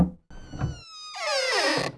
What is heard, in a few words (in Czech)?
dvere otevreni; Mafie 1